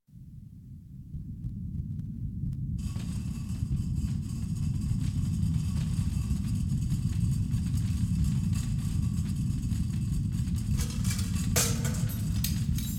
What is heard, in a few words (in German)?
erdbeben mit einstürzendem gebäude; Katastrophen